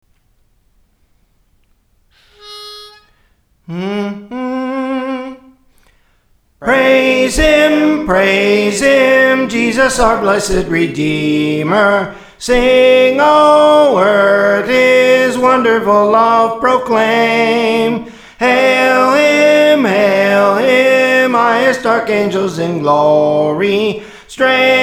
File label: Soprano, Praise For The Lord Training; Praise Him Praise Him